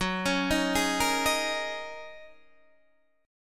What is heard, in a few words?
Gb 6th Add 9th Gb down Guitar Open D